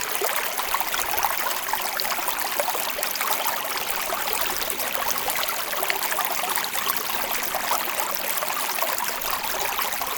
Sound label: Water Streaming